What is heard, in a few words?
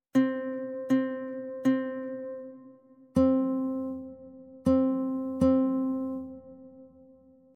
Andante 80